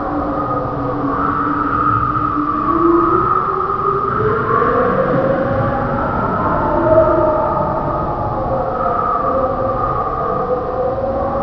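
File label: ambience wind whistle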